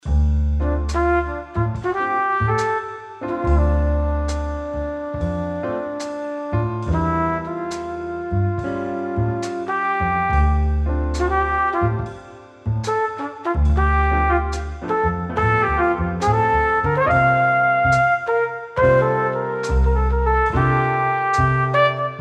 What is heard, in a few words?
TRUMPET JAZZY